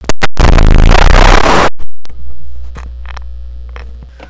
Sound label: rain